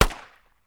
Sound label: colt shot